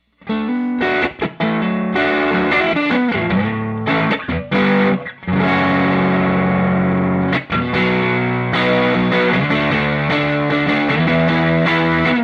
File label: overdriven